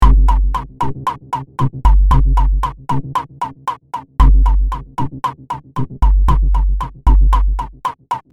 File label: E Drum and synth loop